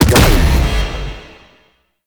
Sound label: Alt Fire Explosion, Weapons; Warframe; Corpus; Tenet Quanta